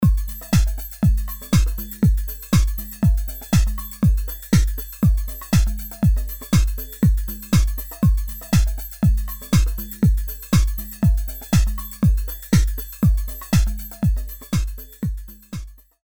Deep House Dance Beat; Apple GarageBand 2; Apple Loops

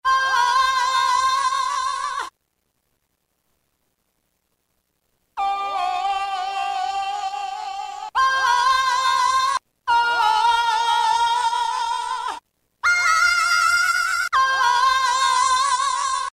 Vocamatic; Roland M-DC1

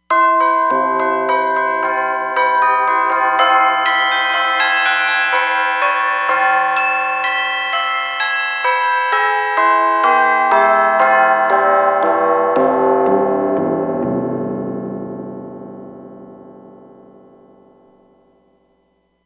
Tubular Bell

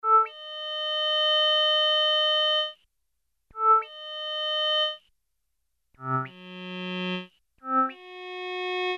Korg Poly-800

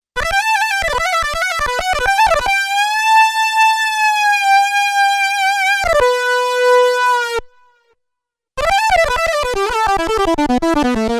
UNISON LEAD, Korg DW-8000; Factory Patches

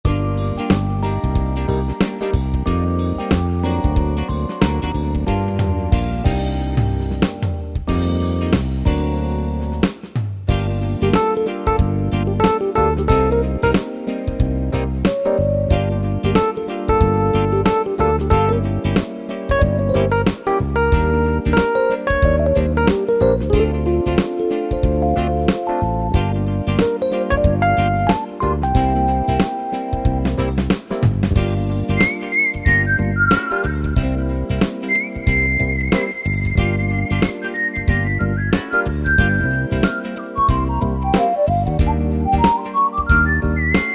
Fusion Shuffle, Yamaha PSR-3000 pop & rock style

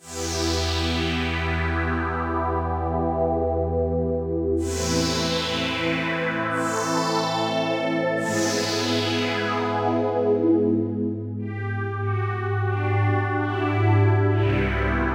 Sweep Down Pad, Korg Radias-R

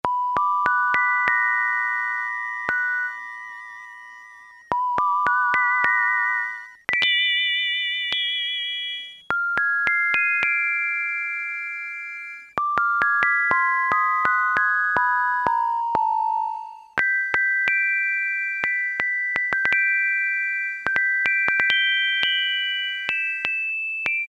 MUSIC BOX, Yamaha SHS-10

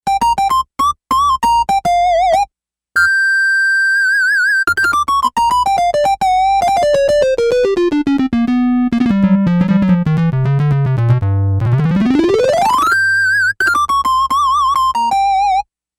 Syn Lead, Yamaha DX11; Factory Performance Voices

